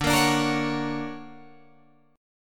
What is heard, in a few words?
D Augmented Major 7th down Guitar Drop D